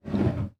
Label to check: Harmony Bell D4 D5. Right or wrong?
Wrong